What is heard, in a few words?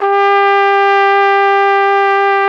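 FLUGELHORN G; Best Service; Alpine Volksmusik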